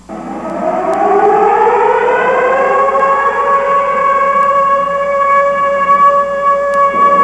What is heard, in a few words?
siren